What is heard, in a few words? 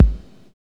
drum, 808, drum machine; Cheese menus